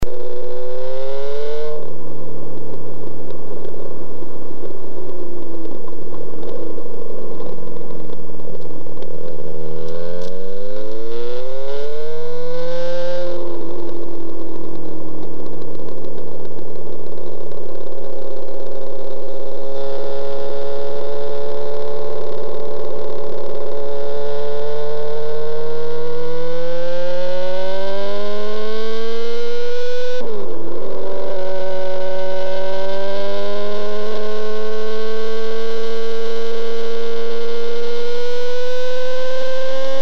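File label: 1990 Kawasaki ZZR11 BSM Vampire Pipe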